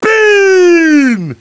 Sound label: blast, houndeye